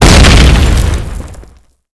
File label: grenade explosion; Explosions